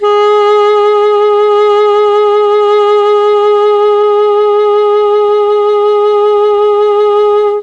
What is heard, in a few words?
G#2 ALTO SAX, Mellotron; Mike Pinder - Mellotron Archive CD; CHMB ALTOSAX